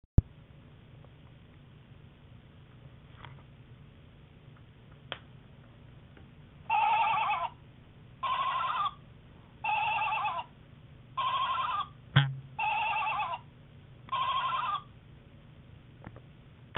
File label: creepy sheep